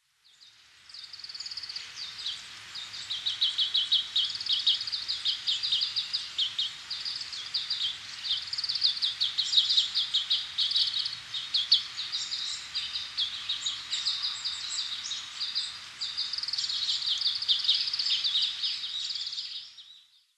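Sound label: kawarahiwa, Oriental Greenfinch; hino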